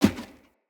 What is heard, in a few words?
Heavy Grunt Boot Walk Wood, Footsteps; Enemy; Sinecure